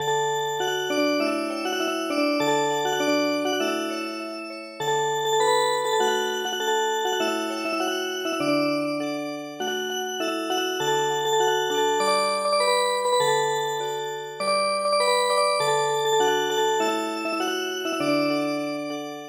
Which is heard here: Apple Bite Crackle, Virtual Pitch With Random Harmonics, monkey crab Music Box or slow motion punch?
monkey crab Music Box